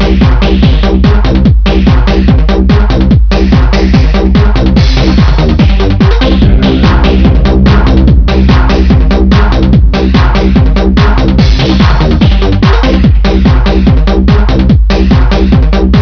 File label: club song